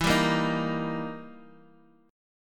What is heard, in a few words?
E Minor Major 7th Flat 5th, Guitar, Open D; chord shape